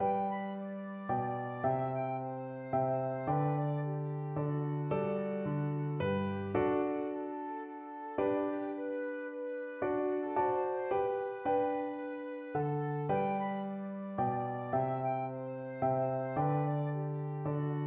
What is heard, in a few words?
mozart minuet, flute